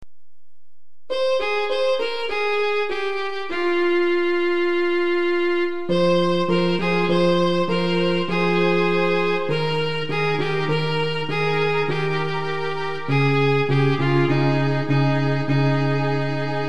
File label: chechen folk music